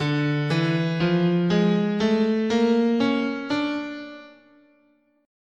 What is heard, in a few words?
D Hungarian Gypsy, guitar, scale